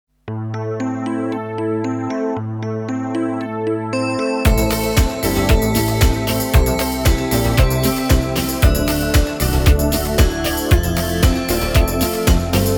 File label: Dance Mix